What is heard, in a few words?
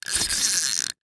Sports Reel Fishing Pole Quickly Short